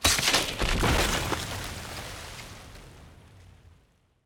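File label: ice break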